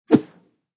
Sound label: Sword swings in air; Combat Swings